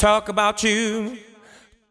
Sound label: deep house male, 125, A, vocals